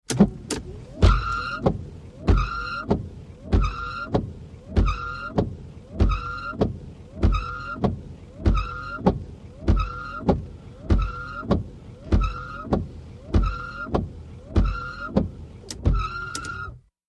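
Mitsubishi Montero interior: Windshield wipers, interesting squeak; VEHICLES MITSUBISHI MONTERO: INT: Windshield wipers, interesting squeak.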